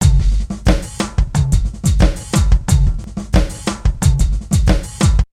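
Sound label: super good drum sound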